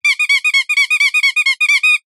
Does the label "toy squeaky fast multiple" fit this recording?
Yes